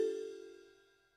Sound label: Loop Tail; Good Job